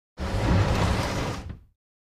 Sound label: Large Chest Slide